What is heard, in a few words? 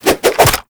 Nunchaku Quick Melee Return; Warframe; Tenno